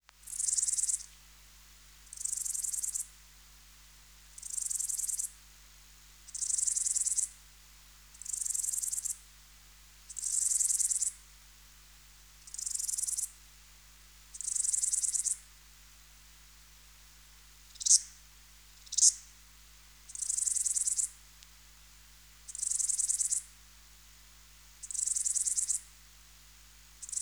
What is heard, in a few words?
Baetica ustulata